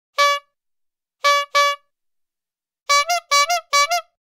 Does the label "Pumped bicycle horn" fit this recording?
Yes